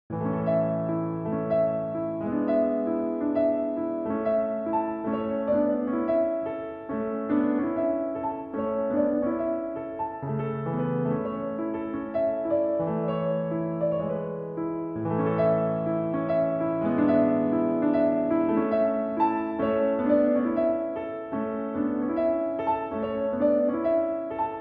Piano Concerto No. 26 K.537 Coronation Concerto in D Major, Arr. Solo Piano; Carl Reinecke